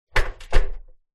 household washing machine door close internal inside